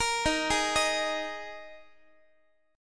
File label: Dsharp Suspended 4th Guitar; arpeggiated; x,x,13,13,12,14; 4A,4E,5A,5D,5G#,6C#; chord